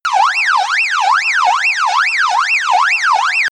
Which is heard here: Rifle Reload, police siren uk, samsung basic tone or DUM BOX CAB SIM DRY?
police siren uk